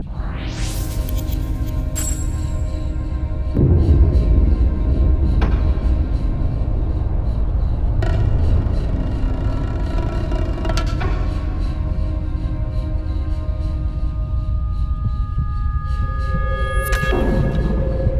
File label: Ambient The Crypts; rayman arena